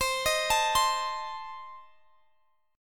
A Diminished C

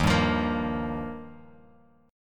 D sharp Major Add 9th, Guitar Open F; strummed; D#add9; 3,2,5,5,x,x; JGuitar

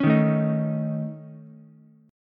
A Major Flat 5th A Ukulele, chord; AMb5; strummed; JGuitar

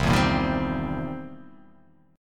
C 7th Sharp 9th C; strummed; JGuitar

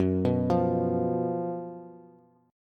Gb Major 7th Suspended 4th, down, Bass; arpeggiated; JGuitar

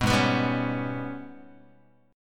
G sharp Minor Major 7th Double Flat 5th, Guitar; strummed; JGuitar

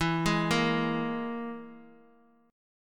E-Major Flat 5th E

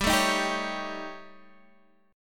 A 7th Flat 5th G

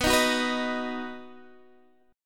D sharp Minor Sharp 5th B, chord

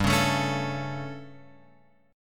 G Suspended 2nd Flat 5th G, chord; Gsus2b5 Chord {3 4 X 2 2 5} strummed

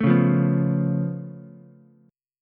C sharp Augmented 7th, C sharp, down, Ukulele; C#+7; strummed; JGuitar

